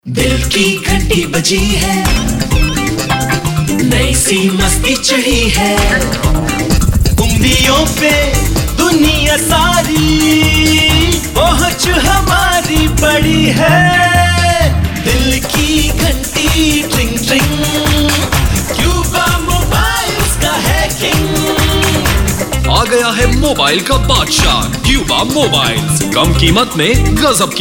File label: FM ad musical